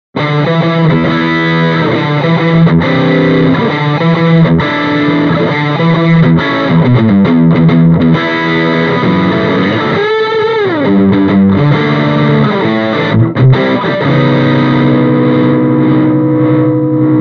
Distortion, Stratocaster; Mini Rox Mic Inside; THE GOVERNOR

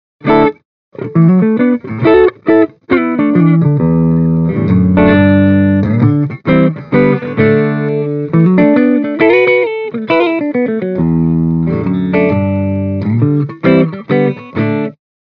Mic Inside Clean Les Paul; Mini Rox

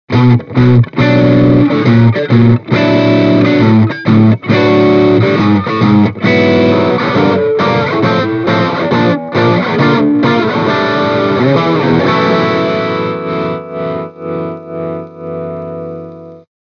Overdrive, Les Paul; Mini Rox Mic Inside

